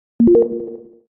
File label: pickup, calls